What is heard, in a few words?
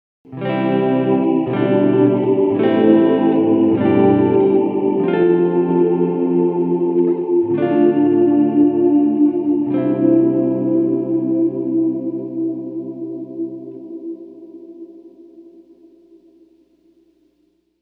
chorale; bigsky; reverb; Pearl’s Gates